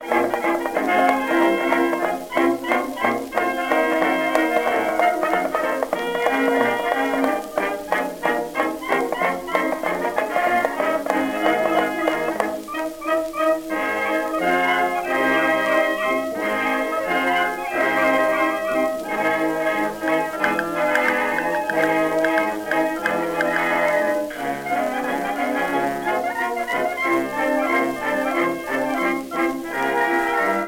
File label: Chinese blues, jukebox blues